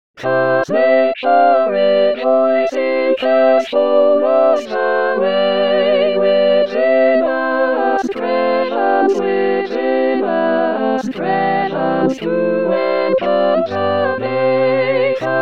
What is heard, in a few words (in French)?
voix Gd Chorus tutti; purcell; ode 1694